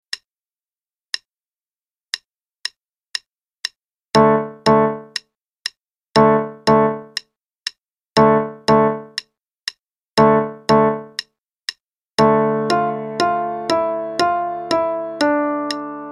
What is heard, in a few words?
Forest Drums, qn=120; Faber and Faber; Level 1 2nd Edition - page 36